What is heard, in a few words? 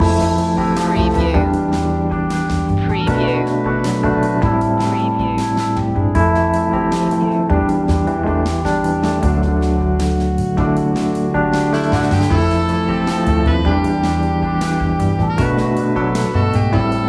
central groove loop